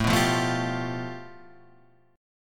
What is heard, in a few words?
A 7th Suspended 2nd Sharp 5th